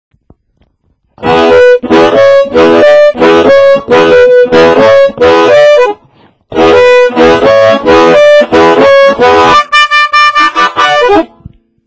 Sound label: clean sm57, harmonica